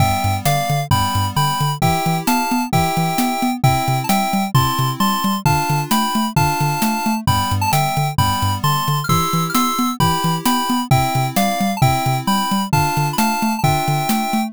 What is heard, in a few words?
bgm street